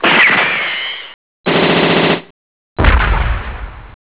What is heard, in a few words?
gun